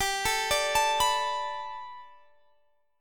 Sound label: B Minor 7th G, chord; Bm7/G arpeggiated